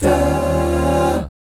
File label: EB13 AA, GUY CHORDS; Spectrasonics; Vocal Planet; Jazz FX